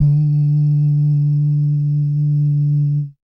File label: bass dums; Spectrasonics Vocal Planet; Jazz FX